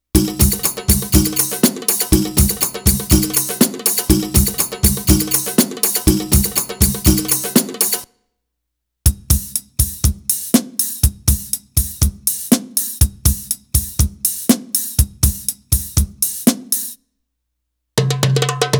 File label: Arabic Rhythms